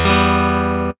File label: chord Em